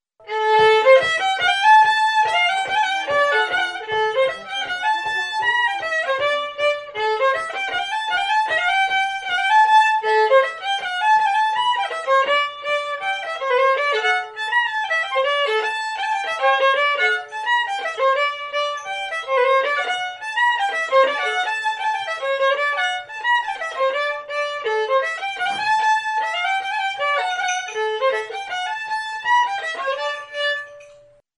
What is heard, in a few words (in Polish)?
polka kolbuszowska